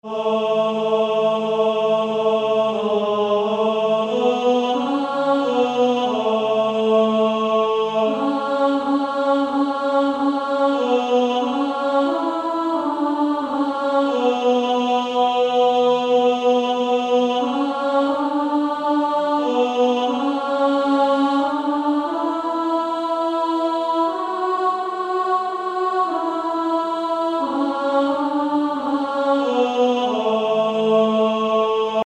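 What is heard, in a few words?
Male Choir, tenor 2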